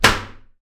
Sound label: CUFF, Anime Sound FX; AKAI Anime Sound FX